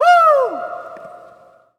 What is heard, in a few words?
Hockey Male Woo; Hockey Vocal Sounds